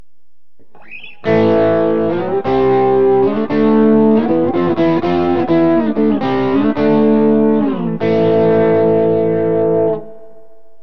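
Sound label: evh bridge, guitar pickup; burny; fugly clips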